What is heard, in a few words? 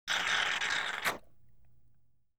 Plunger; BBC Sound Effects Library